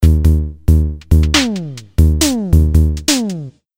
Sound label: Loop 138bpm, Drums; leloops